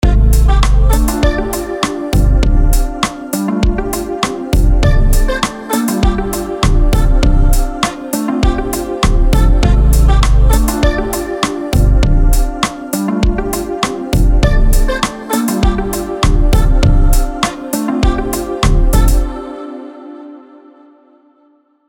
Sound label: Scatty vibes